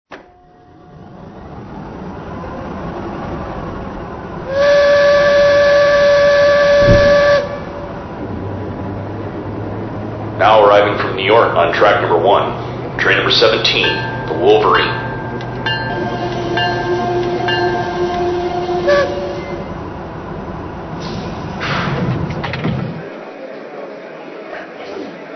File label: P2 BoxCab